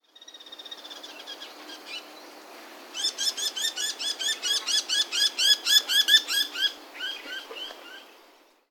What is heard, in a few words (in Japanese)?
モズ; 第一調節池